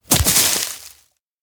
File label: grab branch; anemoi パッケージ版 1.1